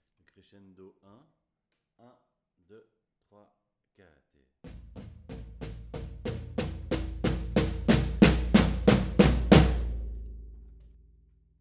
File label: Crescendo, batucada